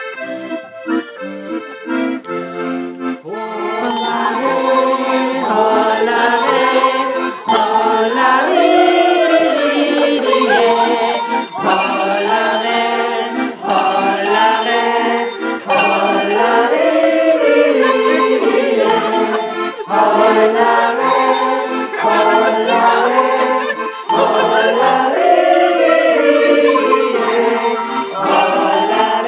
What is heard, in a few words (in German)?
Jodelseminar Glockenjodler